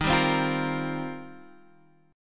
D sharp 6th Add 9th, D sharp, down, Guitar, Half Step Down